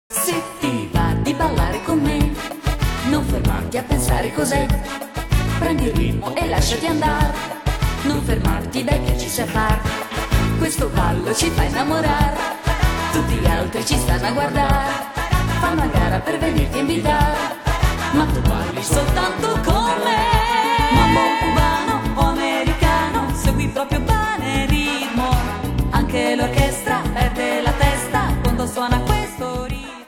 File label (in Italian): ritmo mambo